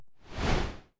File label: ranged magic energy strands; 30ft; psfx